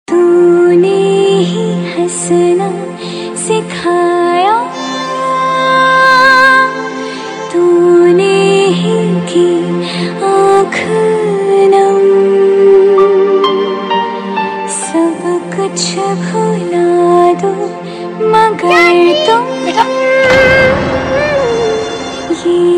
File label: Sad Version; TV Serial Tone; Sony